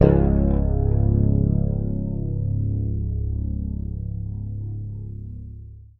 Bass

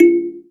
KALIMBA; Spectrasonics; Heart of Africa; KALIMBAS

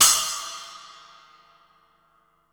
CYMB; Houseworx; Drums; Cymbal

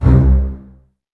Basses C 2, String Ensembles; Miroslav Vitous; LINKS ORCH

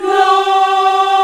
VOX, African Aahs, African Las

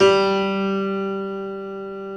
G2 R+R PNO; Keith Emerson Signature Series

